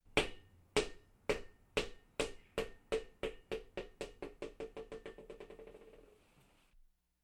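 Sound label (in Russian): Мячик упал на пол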